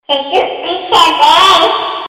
Cute Baby Sms

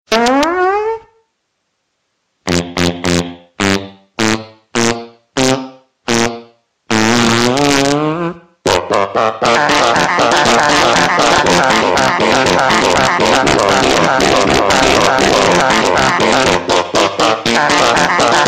Fart Music Funny

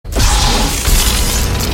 lightsaber; Kylo Ren

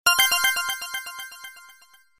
Dropping tone

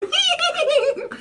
Horse Laugh

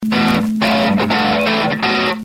Sms Rock Guitar

